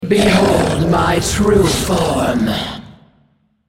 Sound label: Dragon move; Shyvana